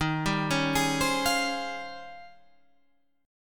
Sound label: D sharp 13th, Guitar, Half Step Down